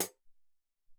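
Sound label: Closed Hat, Alesis SR-18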